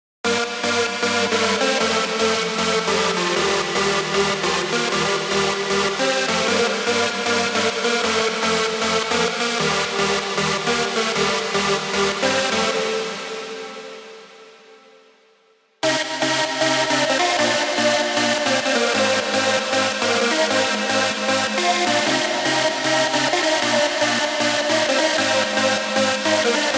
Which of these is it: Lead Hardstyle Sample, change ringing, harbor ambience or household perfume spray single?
Lead Hardstyle Sample